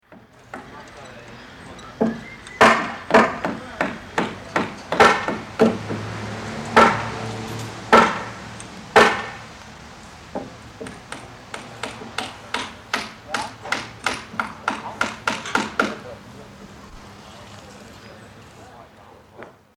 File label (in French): marteau charpentier marteau macon, chantier; Culture sonore